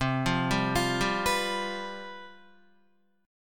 C Major 7th Suspended 4th, down, Guitar; arpeggiated

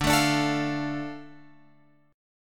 D Minor D Guitar Open F; strummed; JGuitar

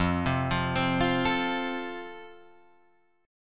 F Major, Guitar Drop D

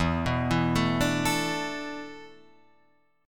E 7th Suspended 4th, Guitar, Drop D; chord shape

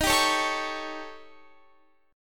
Eb 7th Flat 5th, Eb, Guitar; strummed

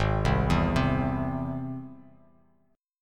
G sharp Augmented G sharp, down; arpeggiated; JGuitar

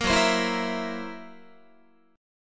Gb 7th Flat 5th Gb Guitar; 13,14,15,14,14,15; Listen to Gb7b5 strummed

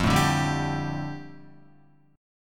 F 6th Add 9th F down Guitar, chord

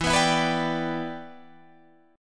E Minor 7th, down, Guitar, DADGAD; Em7; strummed

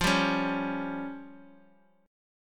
F Suspended 2nd Sharp 5th F down

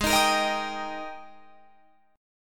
Ab Major 7th Suspended 2nd Ab Guitar Open F; AbM7sus2; strummed; chordshape; 20,22,22,22,22,22; JGuitar

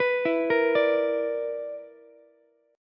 B-Minor Major 7th Double Flat 5th B down Ukulele; BmM7bb5 arpeggiated; JGuitar

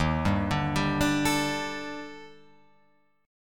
D sharp Major 7th Flat 5th, down, Guitar, Open F; arpeggiated; JGuitar

